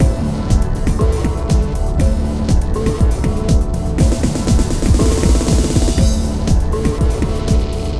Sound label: ambient mood, loops